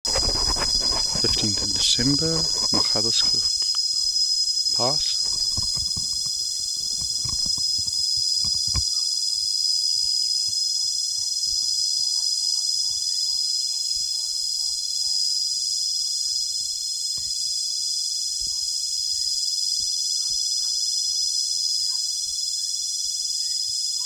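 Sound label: Platypleura semiclara, Tzaneen; P.semiclara nr Tzaneen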